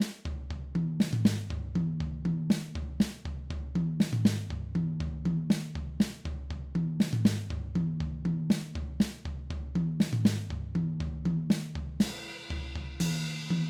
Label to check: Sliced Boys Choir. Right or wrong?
Wrong